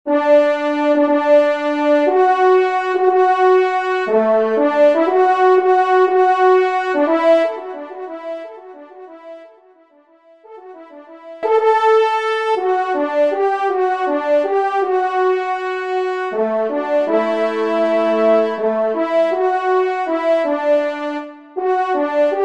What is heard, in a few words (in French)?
trompe; Helene a Mme Minie; E Caneaux